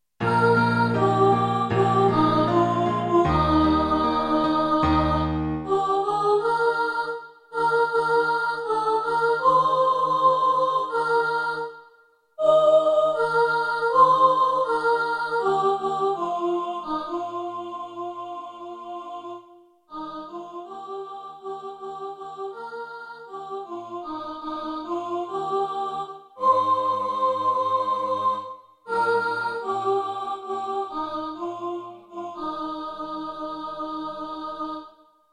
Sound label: hard times soprano, choir